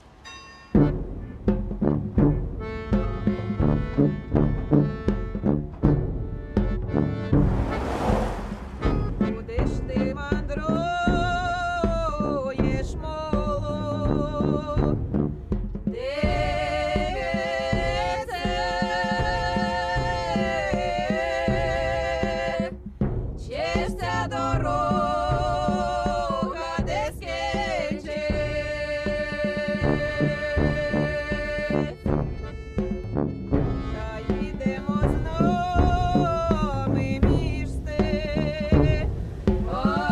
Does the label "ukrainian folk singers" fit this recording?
Yes